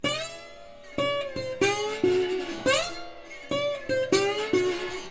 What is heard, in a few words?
Blues Guitar Samples; ILIO - Fretworks